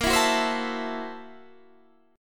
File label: Gb 7th Sharp 9th Flat 5th Bb, guitar